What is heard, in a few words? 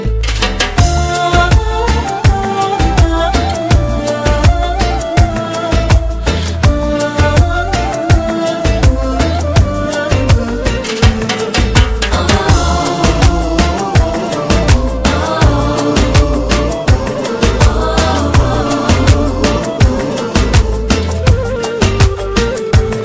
Turkish timbre